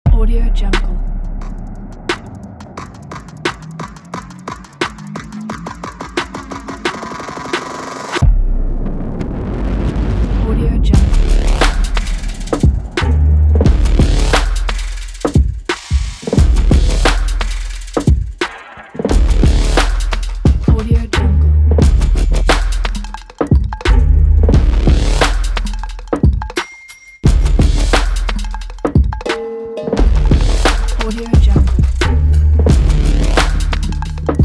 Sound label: sport fashion urban beat